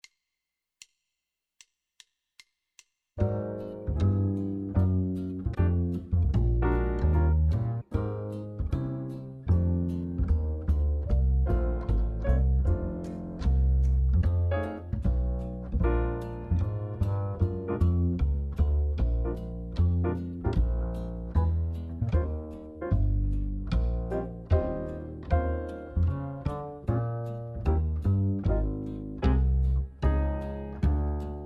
drums play along, medium swing, trade 4s, jazz; LMEA All-State Jazz

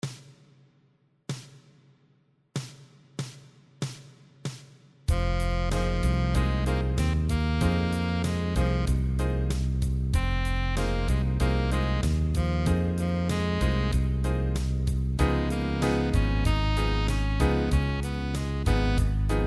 play along guitar

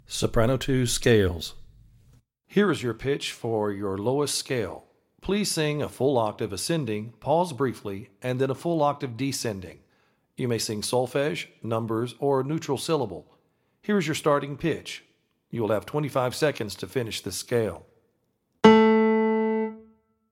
soprano 2 scale audition

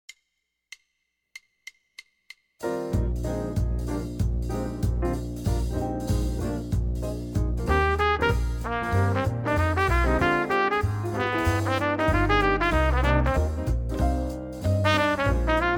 trumpet fast swing, jazz; LMEA All-State Jazz

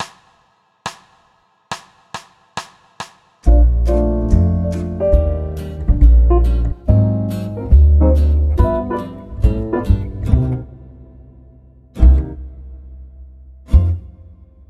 drum set play along, swing, trade 4s, jazz

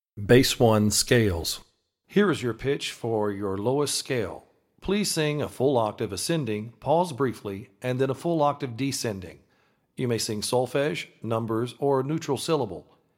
Bass Scale Audition

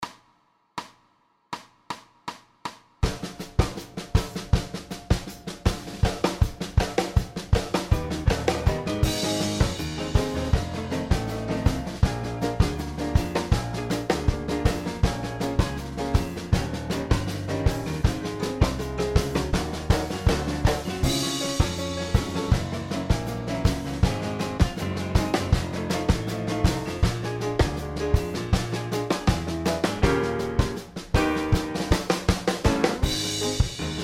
bass play along, alt style, jazz; LMEA All-State Jazz

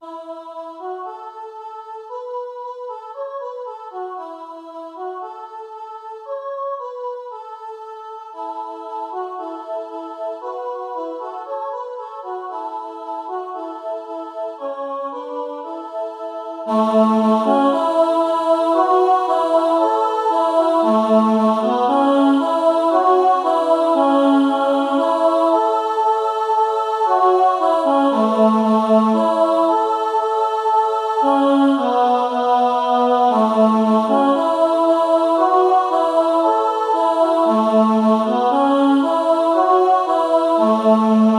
Alto Emphasized; Holy Manna

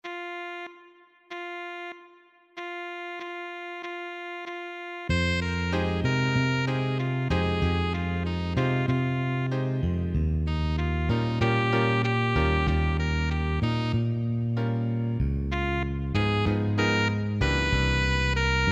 play along drums

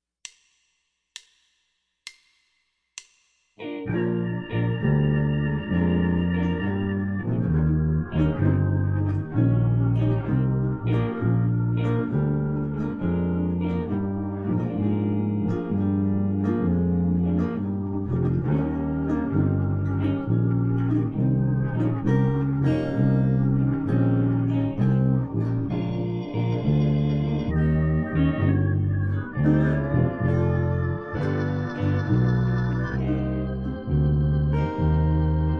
Bb blues drums play along, jazz; All-State Jazz

